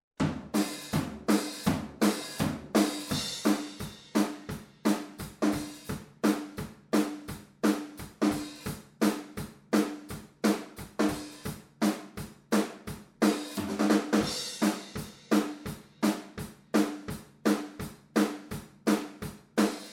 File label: Drums MONO ORTF